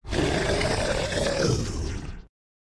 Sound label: Worm Stun